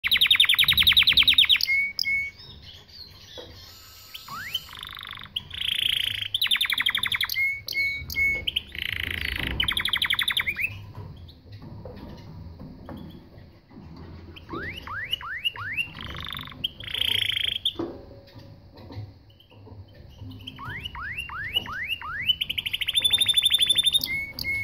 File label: Canary, Bird Songs